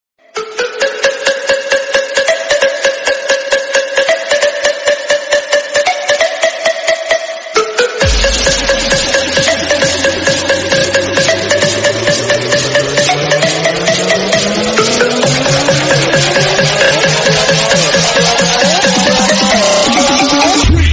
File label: instrumental ringtone instrumental version